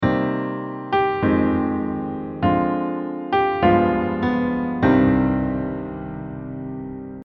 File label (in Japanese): スケールで考えるメロディー